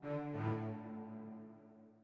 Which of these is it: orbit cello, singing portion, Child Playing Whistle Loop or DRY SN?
orbit cello